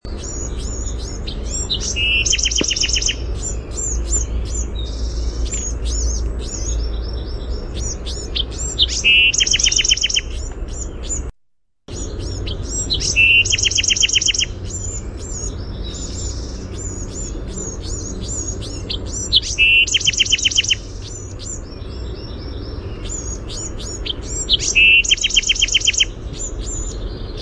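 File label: song, Bewick's wren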